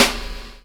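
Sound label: snare drum hip hop; DRMKIT; E-Lab; X Static Goldmine 2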